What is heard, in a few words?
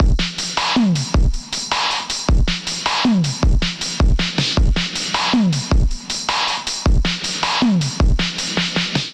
Kit 105 bpm, Drums; MIDI Clips; Expo Kit; Drive and Glow; Ableton; Factory Packs